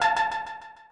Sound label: synth; osu